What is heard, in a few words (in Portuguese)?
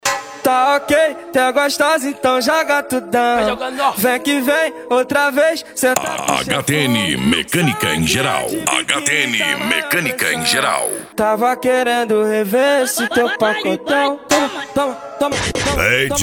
funk e lambadao